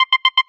freegames increment; Crazy Cars; wazdan

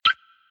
stop scatter; Crazy Cars; wazdan

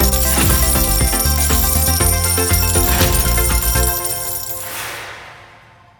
bigwin; Criss Cross 81; wazdan

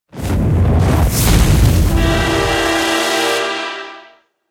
risk lose; gambling; Burning Reels; wazdan

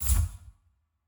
upper table disappear; DraculasCastleWD; wazdan

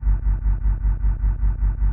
reels loop; DraculasCastleWD; wazdan

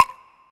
reels start; DragonsLucky8WD; wazdan

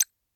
volatility; Colin The Cat; wazdan

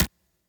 stop; Back to 70s; wazdan; ultra fast

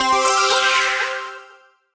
risk win; gambling; Bell Wizard; wazdan

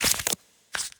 flip front; gambling; Colin The Cat; wazdan; ultrafast

